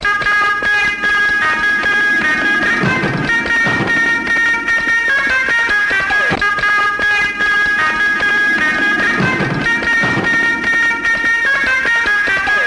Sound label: Funny Tone